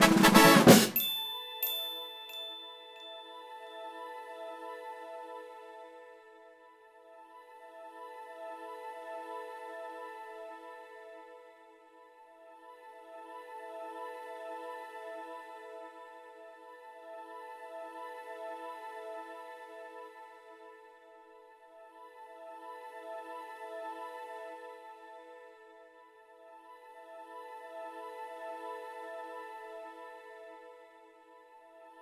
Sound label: Unknown Fanfare; Nintendo Land